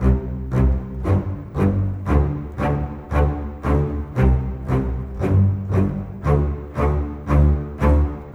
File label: Bass Cello; Sonic Foundry (Sony Creative Software); Orchestral Series VOL-4 - Rock and Pop